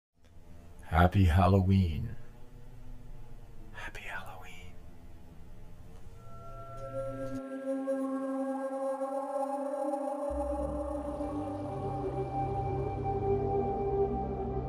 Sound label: spooky